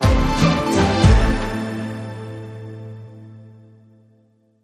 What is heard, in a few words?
Breaking News Logo Only